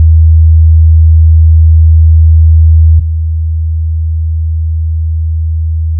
80Hzの音データ/基準音と低減音 [いびきや大型犬の鳴き声など]; ペアガラス対応

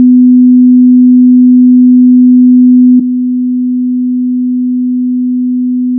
250Hzの音データ/基準音と低減音 [低音のいびきや大型犬の鳴き声など]; ペアガラス対応

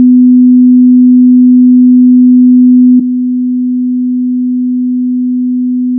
250Hzの音データ/基準音と低減音 [いびきや大型犬の鳴き声など]; ペアガラス対応

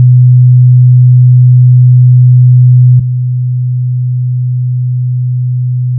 125Hzの音データ/基準音と低減音 [自動車の低音マフラーなど]; ペアガラス対応

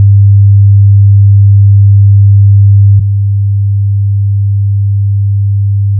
100Hzの音データ/基準音と低減音 [会話音[男性の声]など]; ペアガラス対応

